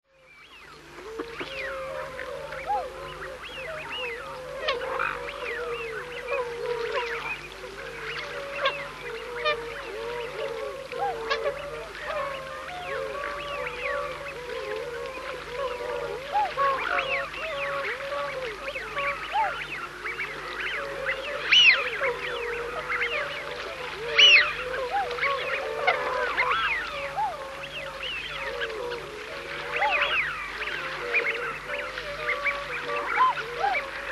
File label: wigeon